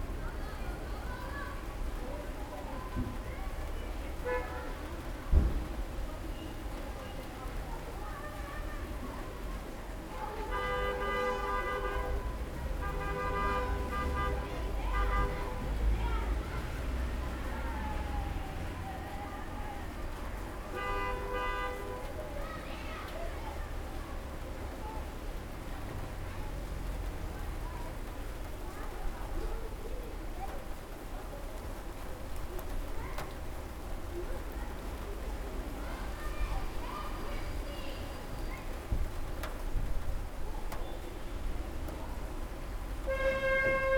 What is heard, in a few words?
rain traffic; 99Sounds; Rain And Thunder